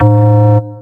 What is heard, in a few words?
dance, bass